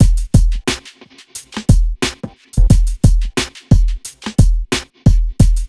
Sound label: BEAT, Hip Hop R n B; USB Soundscan vol.19; AKAI